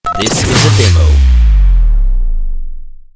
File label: Beep Bass Pitch Boom